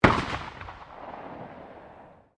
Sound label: RIFLE UNKNOWN FIRING DISTANT; Rifles, non military; Guns